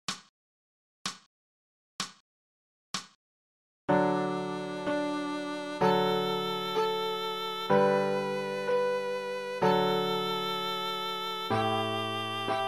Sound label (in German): Twinkle, violaschule